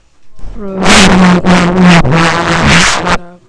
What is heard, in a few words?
bottle